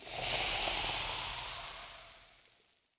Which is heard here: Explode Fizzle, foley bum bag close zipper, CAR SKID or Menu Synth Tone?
Explode Fizzle